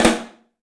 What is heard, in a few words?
STEEL ROLLS; 3D Drums